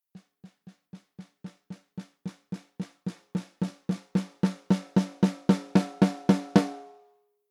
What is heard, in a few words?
Controltrack swell, snare drum; preamps